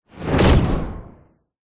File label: science fiction impact bullet hit incoming whoosh thud